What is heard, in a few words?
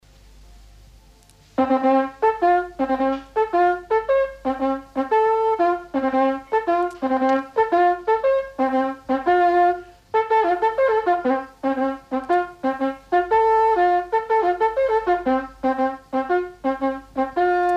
military signal, lur bronze; revheim